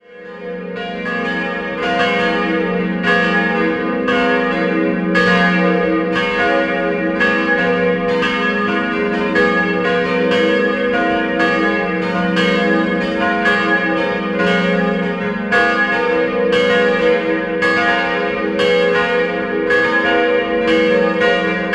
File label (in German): Eckarts, Glocken